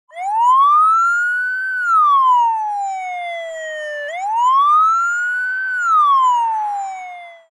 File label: police siren